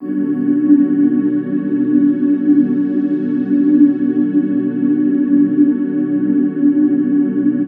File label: Array Pad 125